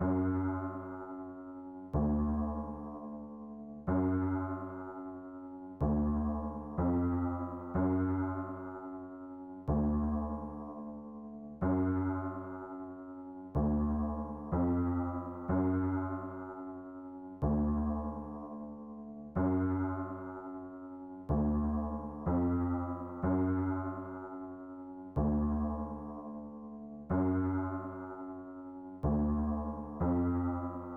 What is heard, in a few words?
124 BPM F# Min Piano; Loop Stems; Cymatics; 2022 Melody Collection Platinum Expansion - Pt 2